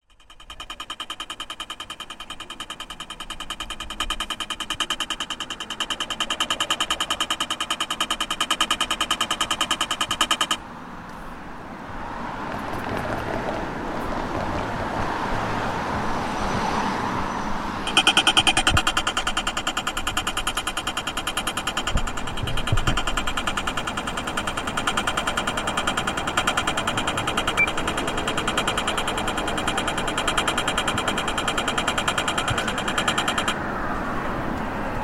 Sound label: tick tick tick siren tram